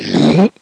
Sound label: zombie alert; mystic radar; mr zombie1